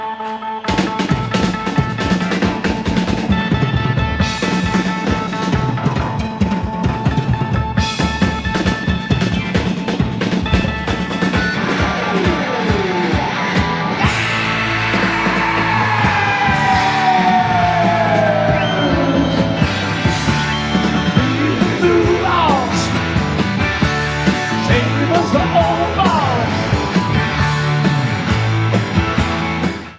Drum Solo; Live In Essen Germany - March, 1981

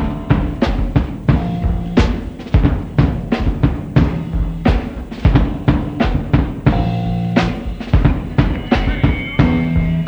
Instrumental Jam; Young Vik Rehearsals - 1971

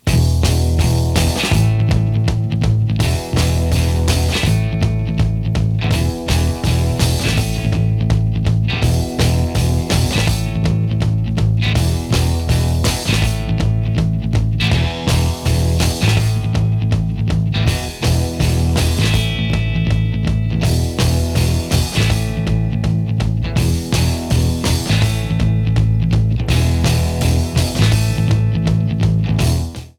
Instrumental

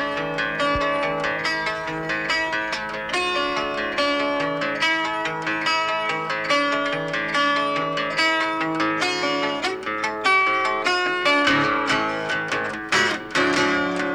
Instrumental; Lone Ranger Sessions; 1968

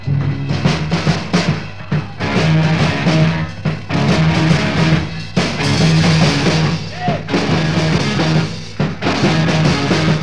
Instrumental Jam; Live 1964

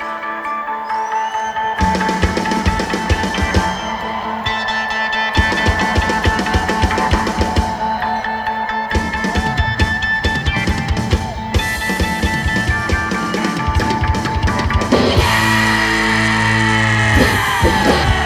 Drum Solo; Live In Sacramento California - October 26, 2022

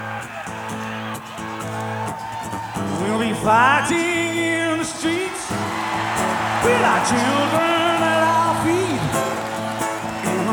Acoustic; Live

